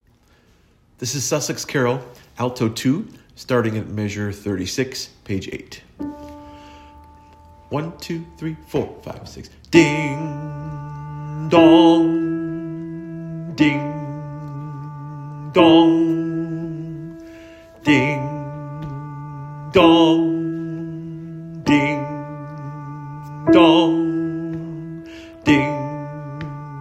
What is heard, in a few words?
alto, adult choir; Sussex